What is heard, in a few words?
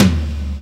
tom, Roland; Club-50 - Foundations Roland; Ext.Snare 3